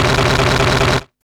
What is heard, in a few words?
CYBERGUN R, Hard & Loud Techno